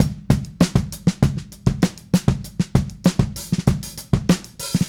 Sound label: Smooth Groove Drums, 70s Analog Funk; Sonic Foundry (Sony Creative Software); What it Is!